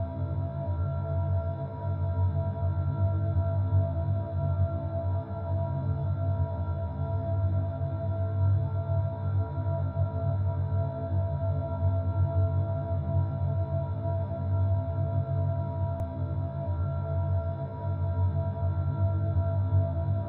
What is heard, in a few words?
Interior Tones, Abstract; Digital Rain Lab